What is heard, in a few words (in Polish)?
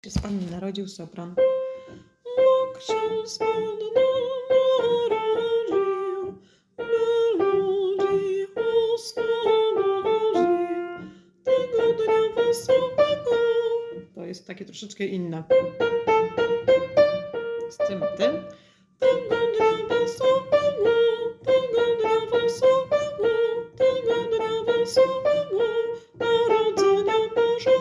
Sopran; Bog sie z Panny narodzil; Boze Narodzenie